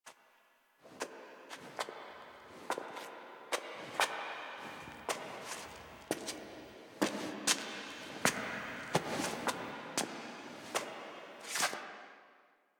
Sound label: Foley Walk; Ostron; Warframe; Quests; The New War; Narmer Outpost; Chamber Archon Intro